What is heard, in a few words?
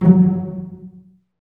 STR SEC, STRINGS; Roland - LA Composer vol. 1